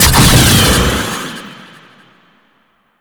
Stealth Boost Thruster; Warframe; Vehicles; Archwing Stealth

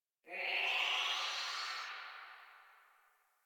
Tree Distant Critters, Ambience; Props; Warframe; Duviri

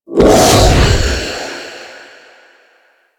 Wraith Reap Proj End; Warframe

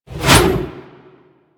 Reaper Scythe Whoosh

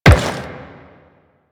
Step Metal, Footsteps; Warframe; The New War; Kahl; Quests

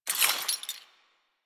Investigate Pickup Shackle; Warframe; Cephalon Weave

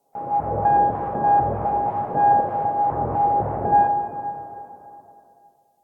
Clan Dojo Misc, Ambience; Warframe; Dojo Randoms

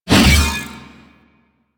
Big Slash Whoosh B; Crp Big Slash

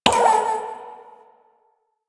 Messenger Mail Open; Ambience; Warframe; Nineteen Ninety Nine

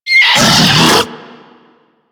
Infested Diseased Ancient Pain; Warframe

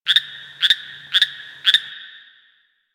Drifter Camp Water Creature, Creatures, Ambience; One Offs; Warframe; Quests; The New War

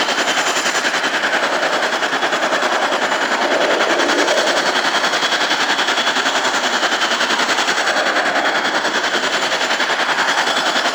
Movement Slow Engine Loop, Vehicles; Warframe; Archwing Demolition

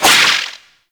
Void Projectile; Warframe; Weapons; Duviri; Incarnon Adapters

